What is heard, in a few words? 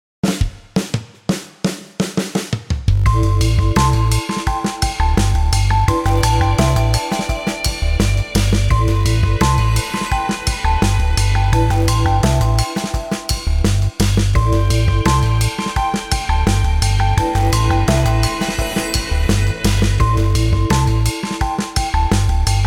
Groove Chill 85